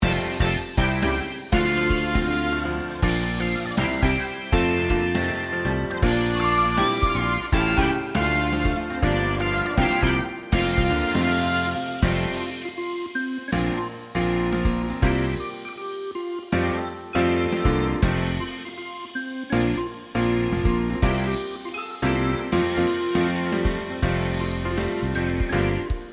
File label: Instrumental